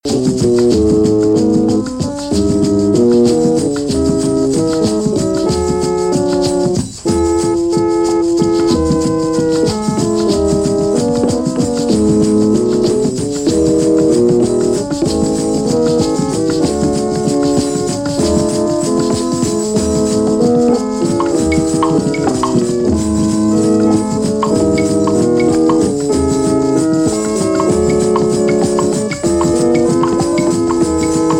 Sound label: Organ Rounds